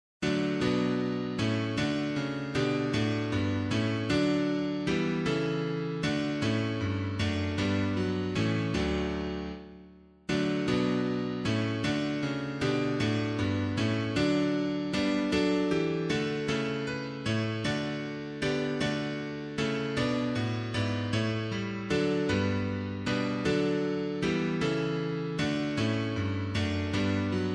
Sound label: piano